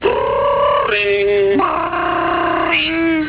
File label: brrring